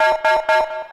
public warning; Original TK Sounds

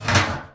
door loop; Golden Crayon Sounds

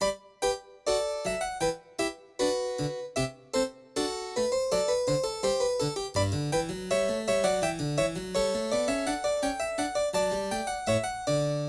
harpsichord gavotte, Yamaha NP-30; Demo Songs

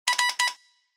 Telegraph; iOS Sounds

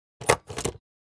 puncher; Golden Crayon Sounds

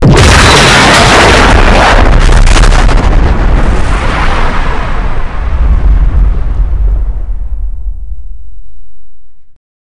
tank die; Original TK Sounds

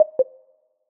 Calibration tone fail; iOS Sounds

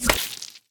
impact; Original TK Sounds

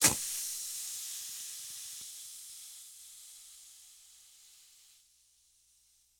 flat tire; RS Games Sounds

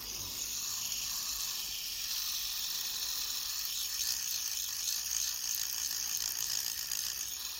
fidget spinner loop; Golden Crayon Sounds

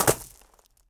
gravel land; Golden Crayon Sounds

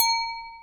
SIM Toolkit Positive ACK, iOS Sounds

